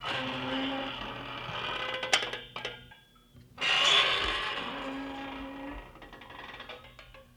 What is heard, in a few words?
Aluminum Door Screen Creak slow